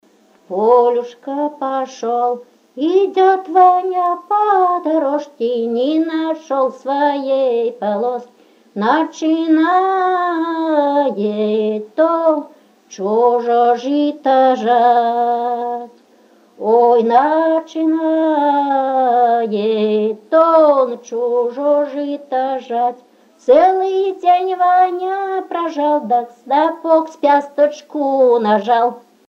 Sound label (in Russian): А мы девушки горох сеяли (свадебная), Песни в исполнении; ОБЪЕКТЫ НЕМАТЕРИАЛЬНОГО КУЛЬТУРНОГО НАСЛЕДИЯ ПСКОВСКОЙ ОБЛАСТИ